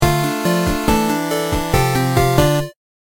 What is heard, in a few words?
Game Over Music; collateral thinking wii u gamerip 2016